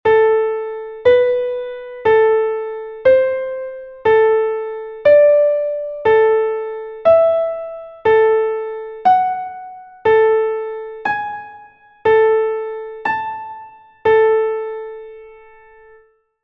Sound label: five intervals ascending melodic minor scale; Essentials of Music Theory: Elementary